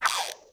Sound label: Rod Cast; unsighted